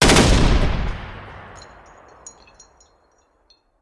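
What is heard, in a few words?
Artillery Cannon Anti Aircraft; Sound Ideas; Guns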